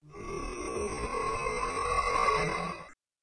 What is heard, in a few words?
skeleton conscious